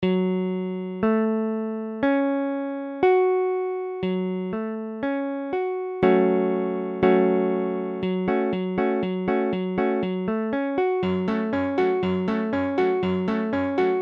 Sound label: II F diesem, guitare